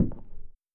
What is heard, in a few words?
Out Side Foot Step